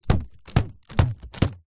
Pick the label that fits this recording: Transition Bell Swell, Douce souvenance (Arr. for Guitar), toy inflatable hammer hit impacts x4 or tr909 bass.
toy inflatable hammer hit impacts x4